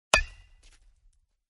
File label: bat hitting sound notification